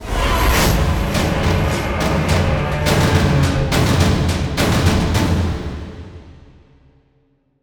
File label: Stinger Fill; extinction deluxe edition; ps4 windows xbox one